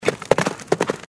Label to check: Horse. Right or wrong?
Right